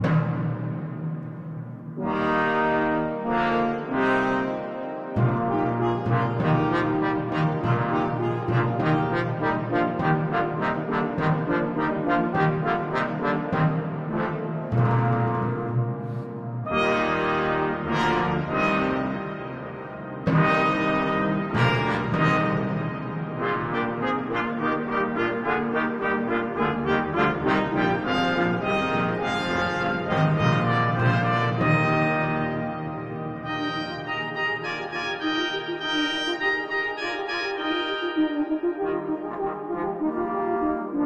Christmas tree suite psallite, arr. brass and timpani